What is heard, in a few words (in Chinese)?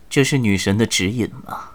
死亡, 年轻的修士; ycrpg; BattleCry